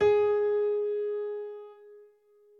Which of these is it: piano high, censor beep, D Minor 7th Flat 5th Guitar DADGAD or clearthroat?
piano high